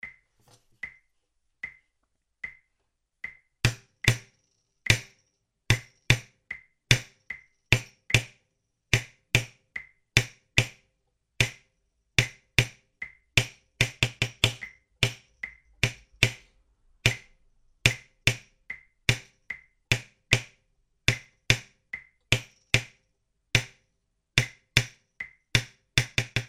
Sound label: HIGH; LAPIAZ 2